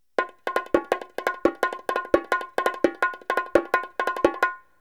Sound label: drum